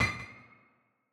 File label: Block, Battle SFX; Leohpaz; RPG Essentials Free